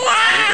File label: cry